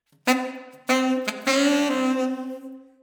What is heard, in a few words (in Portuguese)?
logo sax; libbs